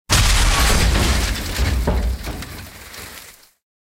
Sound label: wreck explosion; War Thunder